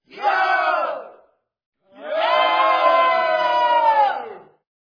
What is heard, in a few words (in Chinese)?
众人喝彩